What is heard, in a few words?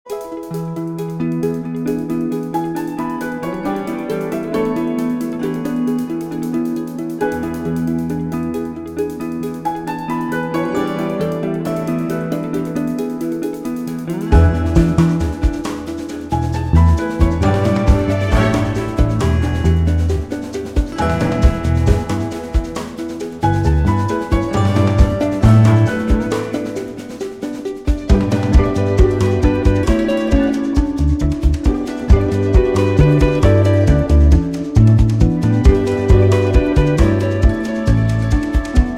Commercial upbeat positive